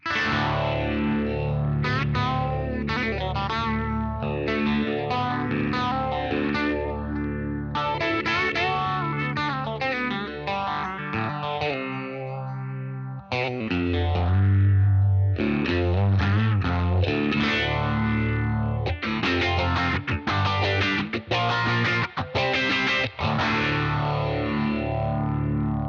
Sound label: Crunch Bridge, guitares; Égérie kit micros HepCat Broadcaster position chevalet crunch